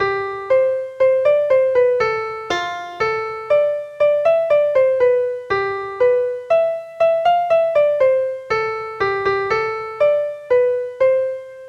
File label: Piano Game Merry Xmas